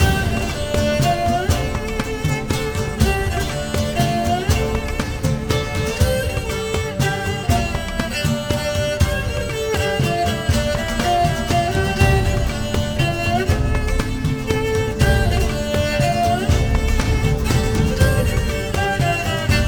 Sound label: Race Loop